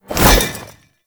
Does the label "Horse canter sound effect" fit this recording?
No